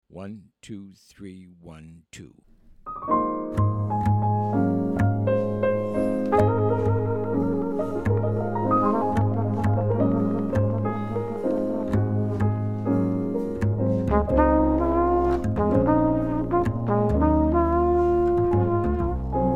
minus drums

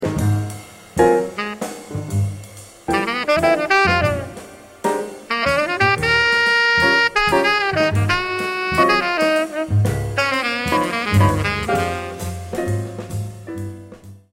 break

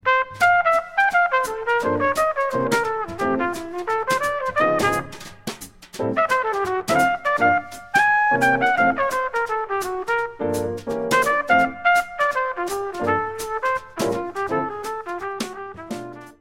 minus bass

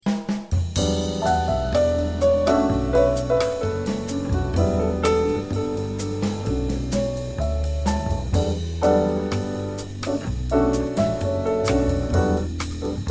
minus melody

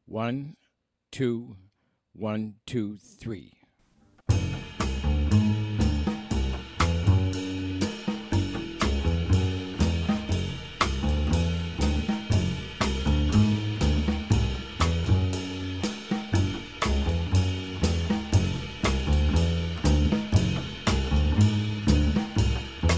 Bass Drums only

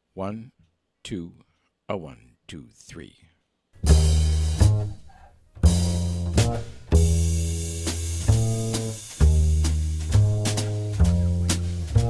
minus Piano

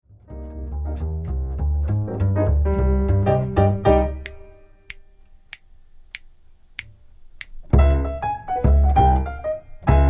minus Drums

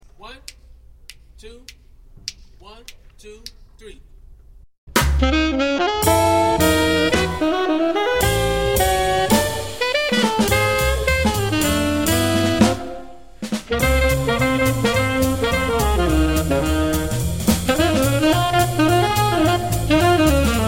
minus piano

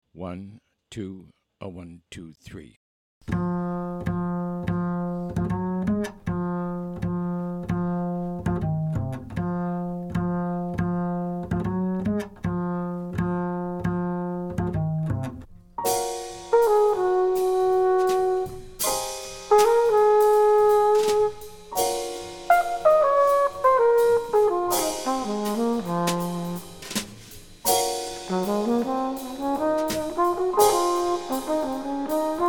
minus Bass

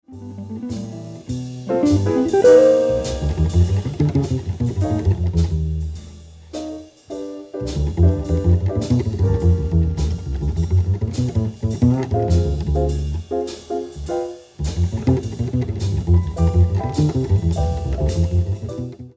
solo

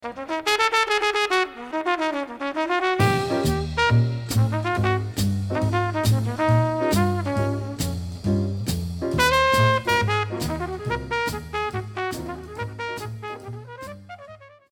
solo

